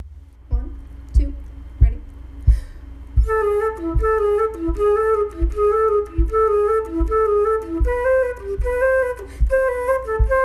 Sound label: oboe